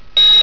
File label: CARDIAC, ALARMS